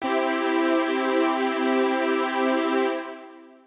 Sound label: synth; DSP